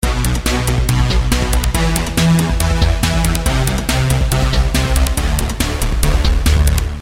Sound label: Techno Ringtone